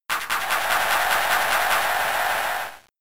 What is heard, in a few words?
Encore